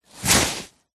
foley clothing high vis jacket thick hand into pocket; zapsplat